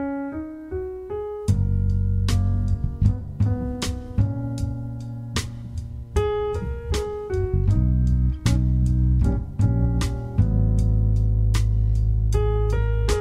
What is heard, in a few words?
jazz